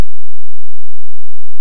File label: silence